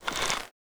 bow pullback; bow deerhunter

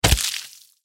bullet impact flesh

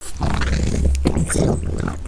zombies misc idle

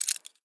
pick movement, lockpicking

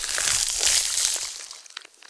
worm idle, giant worm

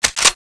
scar bolt pull; cso; scar oz

